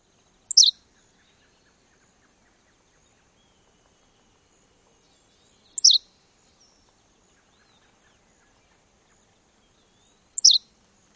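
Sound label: Henslow's Sparrow songs; NY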